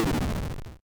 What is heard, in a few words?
lose